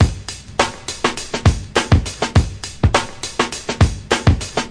102 totally great break, drum loops and breaks; 101 to 110 bpm

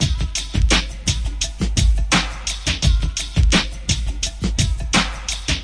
085 mellow bounce basic, drum loops

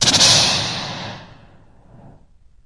air scratch; Scratching and Turntablism; DRUM LOOPS and BREAKS

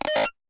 virus filter bleep, Electro Hits

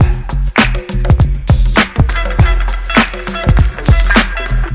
101 hip hop bass n clap bop; 101 to 110 bpm

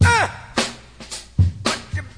109 DRUM LOOPS and BREAKS

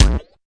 fx drum

